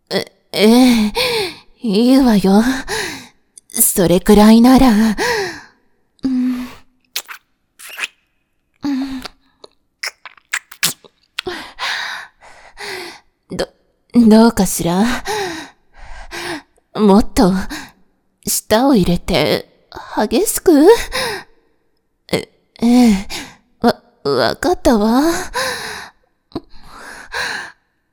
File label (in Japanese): エンドレス・キスシーン